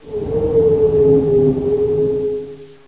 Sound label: wind, ambience; video game; Source Engine; hl1